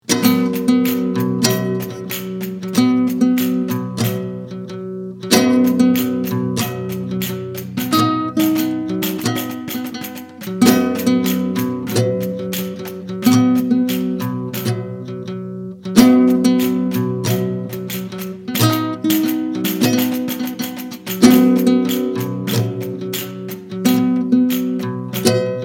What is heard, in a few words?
instrumental bpm 90